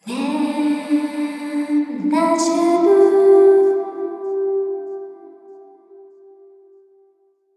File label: Harp Playing; skyward sword